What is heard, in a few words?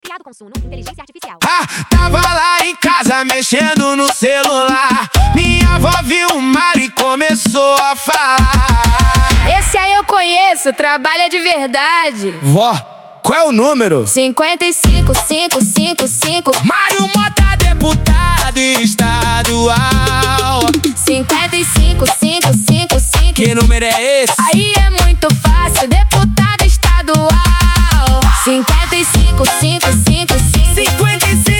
jingle vo funk